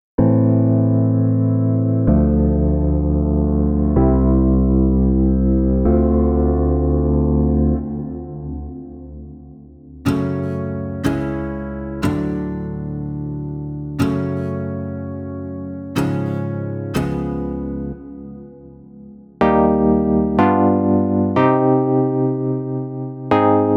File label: Chords Folk Indie